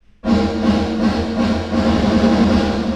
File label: LATE REVERB ECHOES